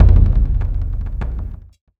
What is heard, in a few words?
Percussive Stab